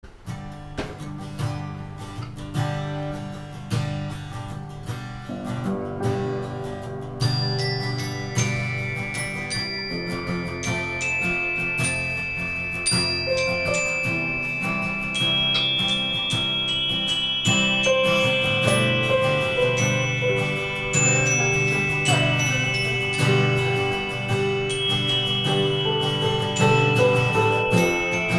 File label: acoustic; cover, acoustic, revisited